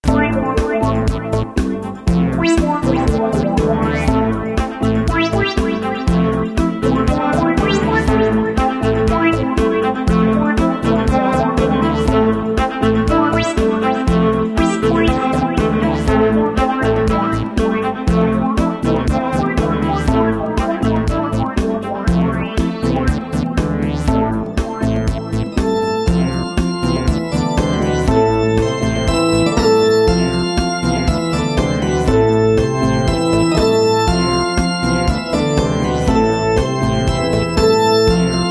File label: disco; radioloops